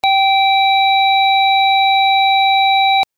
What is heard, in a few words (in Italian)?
suoneria beep